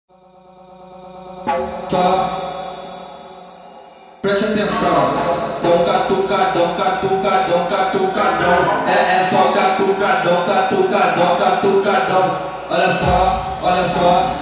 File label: brazilian phonk